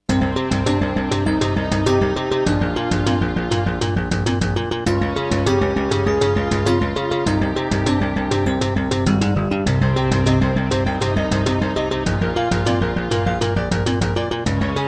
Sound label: Serdaco Dreamblaster X1; Introduction; Hocus Pocus